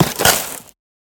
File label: box crush; sonniss gdc